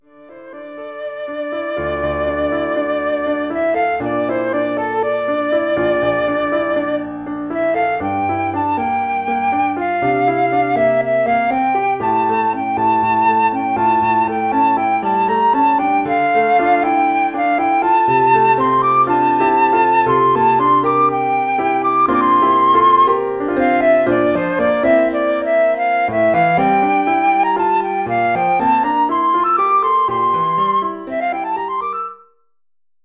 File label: flute solo + PA